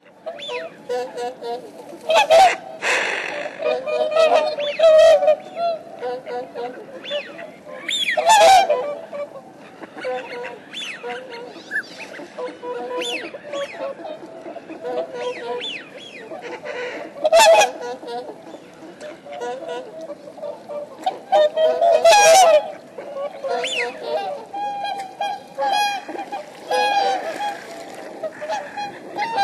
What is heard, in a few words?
swan